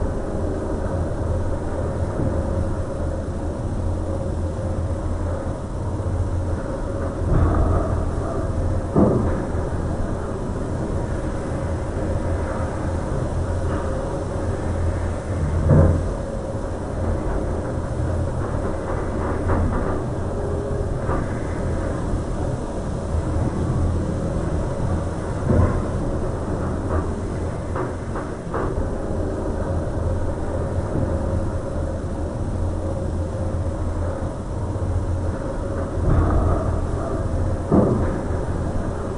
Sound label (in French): Ascenseur slow